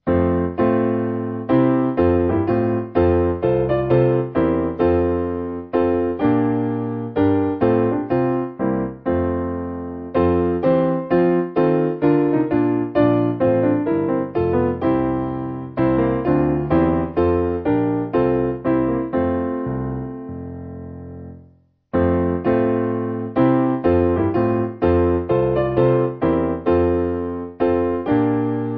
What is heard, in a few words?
Glasgow Piano; Behold The Mountain